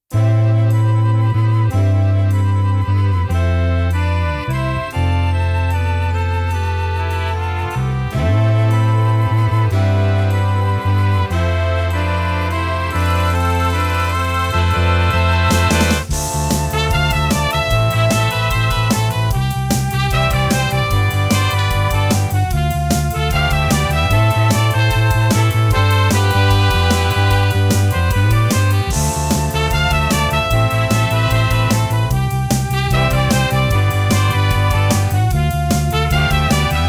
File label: Jazz Score; Early Bird Jazz Score Demos